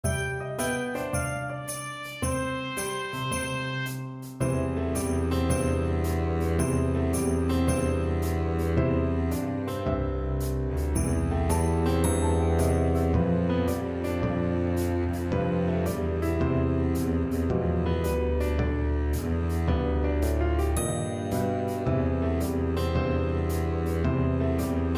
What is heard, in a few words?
Double Bass with Melody; Jingle Bells